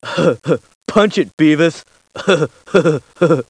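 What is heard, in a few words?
punch it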